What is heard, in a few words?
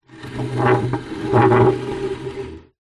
household chair small wooden drag scrape squeak hard ground